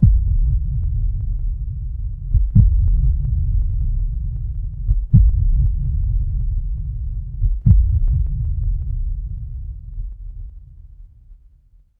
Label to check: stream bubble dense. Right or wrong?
Wrong